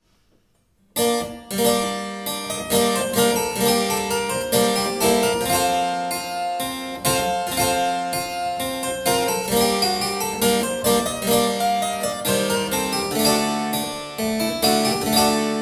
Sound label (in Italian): CLAVICEMBALO Passemezzo Antico; Pavana in passo e mezzo